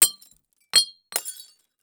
Crash Beer Bottle Drop And Break; Crashes

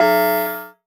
Multimedia Internet CD-Rom Flash Beep Low Pitch

